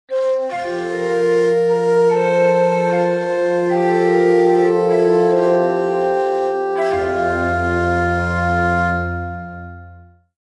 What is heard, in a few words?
Ancient Calm; Civilization Revolution; Xbox